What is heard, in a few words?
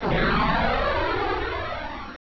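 Missile Launch; F⁄A-18 Hornet 2.0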